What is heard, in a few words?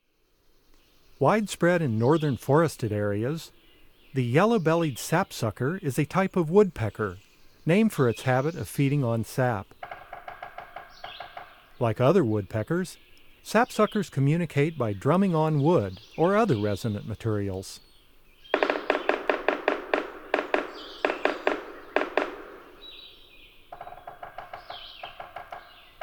Yellow-bellied Sapsucker, Bird Sounds; North America; Common Western Species; Know Your Bird Sounds